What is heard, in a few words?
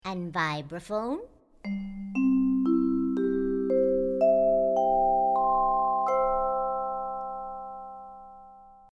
Vibraphone; Classical